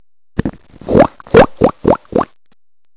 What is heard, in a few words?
pig